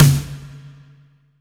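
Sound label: wet snare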